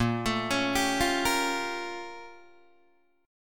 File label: Bb Minor Major 13th Bb, Guitar, DADGAD; BbmM13; arpeggiated; 8,12,11,12,8,8; JGuitar